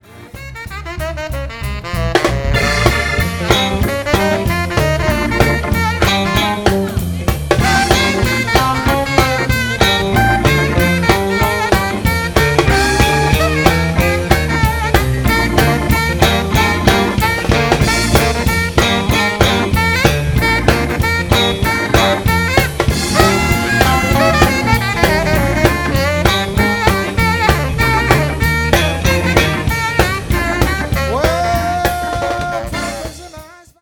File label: Solo